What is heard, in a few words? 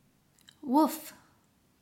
woof